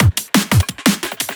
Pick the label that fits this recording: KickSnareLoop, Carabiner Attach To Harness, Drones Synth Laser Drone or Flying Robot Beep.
KickSnareLoop